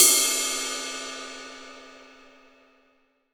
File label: 22INCH ZIL RIDE, Ride Cymbal